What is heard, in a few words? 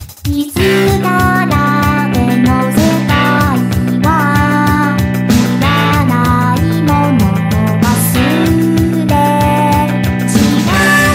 Japanese Ringtone